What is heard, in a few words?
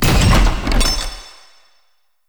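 crate platform start; Entrati; Vaults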